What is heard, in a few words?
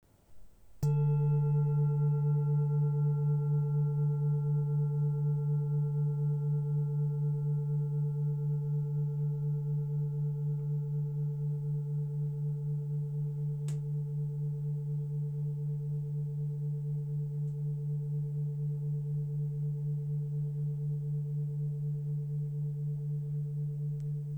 Shank